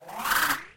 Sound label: Key Grow